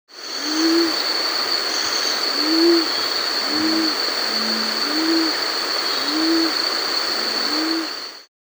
Band-Tailed Pigeon, Columba Fasciata, Nature's Songs, S. America